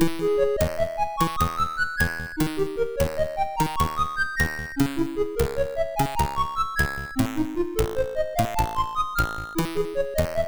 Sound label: Looping, sid MOS6581R3